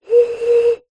bottle beer blow air top liquid inside

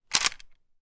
gun marlin 336; still north media

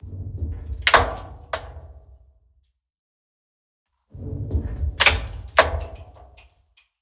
Impacts SIMPLE; soundbits

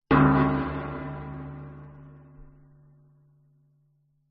impacts metal big hit chime resonate designed

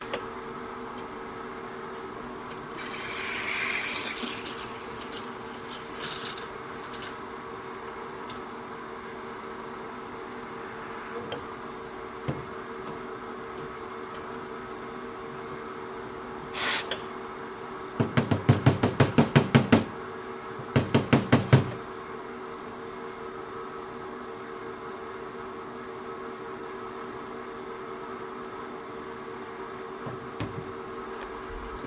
coffee machine

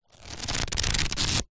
household book pages flick fast; zapsplat

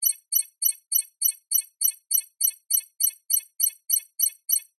science fiction alarm small tiny thin; zapsplat

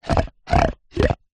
animals pig grunt snort

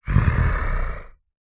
horror monster soft growl closed mouth

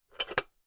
household safe small desktop metal lock

